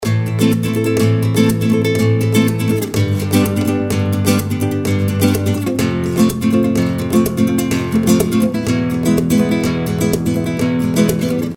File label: Guitar Loop